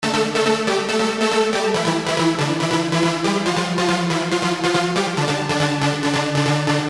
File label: trance lead